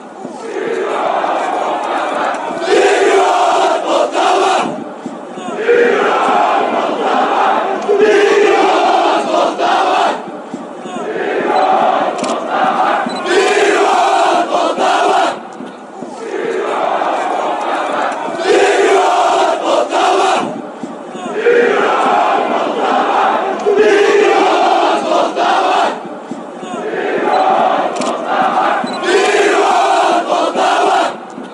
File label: fanchants, ukraine